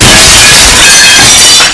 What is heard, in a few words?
glass; quake2